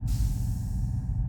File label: PEACE WALK, Mob; NECROMETA